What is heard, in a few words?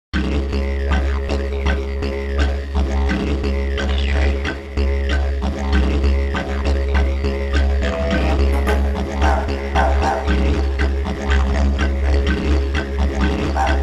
didgeridoo performance short